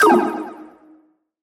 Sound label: Impact FX; Video Game; Galaxy Rush; Cymatics; Video Game Sample Pack Vol 1